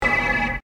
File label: station red alert; memoryalpha